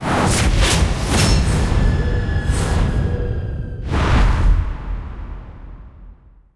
Warning; Monster Hunter Frontier Z Zenith; PS Vita; PS3

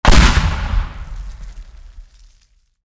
explosion; teardown; ps5 windows xbox series xs

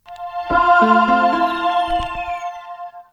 level up

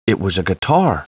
guitar

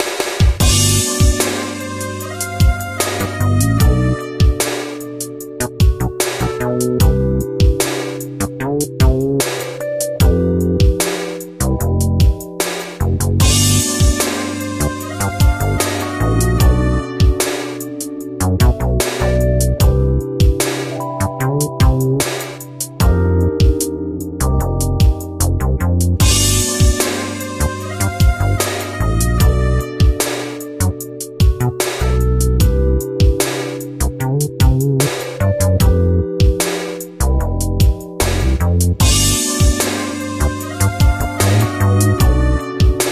Slow Jam; Pocket Mortys

